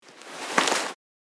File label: Leather manipulation movement noise